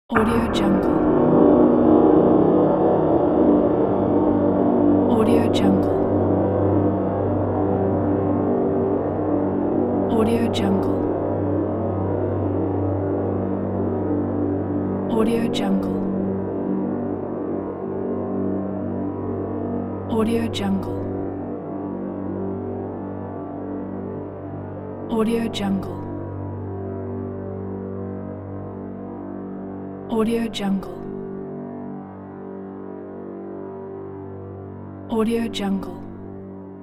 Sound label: cinematic fantasy horror drone